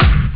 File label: drum; jazz jackrabbit 1; M8